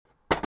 wooden crate, clattering

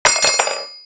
small knife, clattering

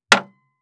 drop down, large wood longthin rollingpin

